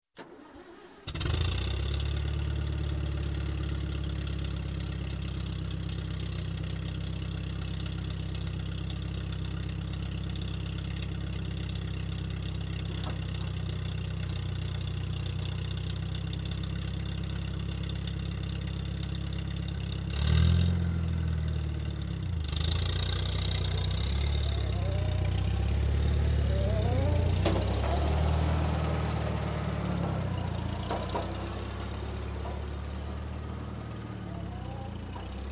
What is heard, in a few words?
Tractor, Gas Start, Idle, Pull Away, Industry; Farm; Sound Ideas 2000 Series